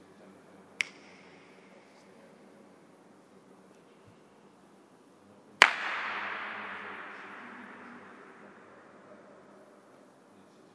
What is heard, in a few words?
building ambience